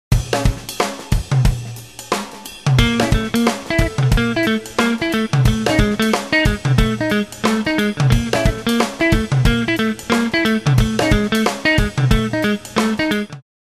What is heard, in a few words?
Rhythm Guitar